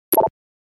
computer bleep; NCH Library

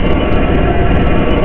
Metallic Energy Scrape Loop; SFX Kit; Sci-Fi Loops

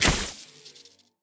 Lightning Enemy Footstep; AudioWizards

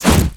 Creature Melee Physical; Kilta

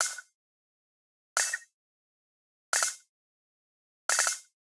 Generic Click Digital

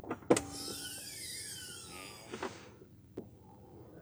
Lamborghini Door Open, Automobiles

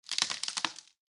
Burned Tree; Blind Quest - The Frost Demon

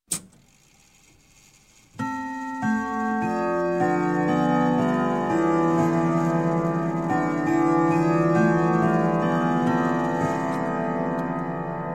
Clock, Grandfather Whittington Chime - Half Past; Half Past The Hour; Sound Ideas 2000 Series

